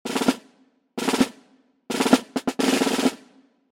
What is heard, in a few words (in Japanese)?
出発行進のスネアロール